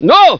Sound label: sci fear, scientist